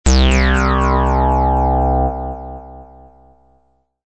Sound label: Sweep bass Dig Del